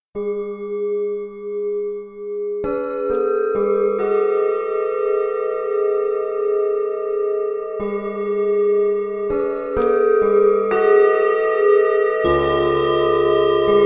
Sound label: piano tibetan bowls and bells twelve elevenths; non octave